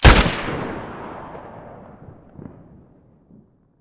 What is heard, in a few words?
svu muzzle mono